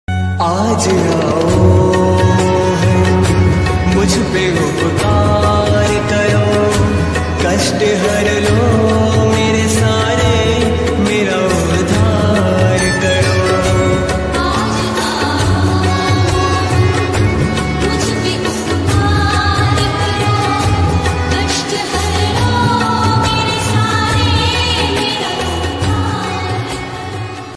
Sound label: hindi devotional ringtone